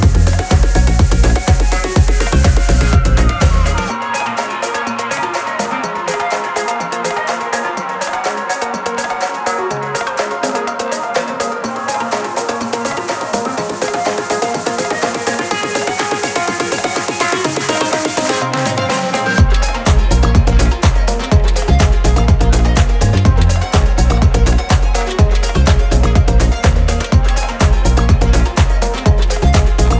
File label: Dreamy